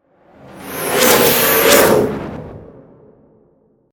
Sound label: Dimension, Portal, Suction, Science Fiction, 5.1